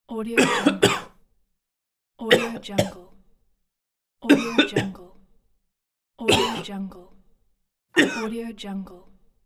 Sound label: coughing